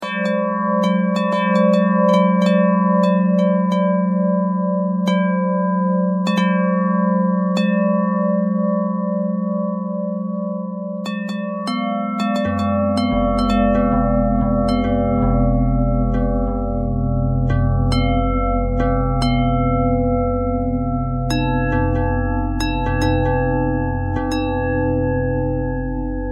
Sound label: bell bell thread pentatonic, bells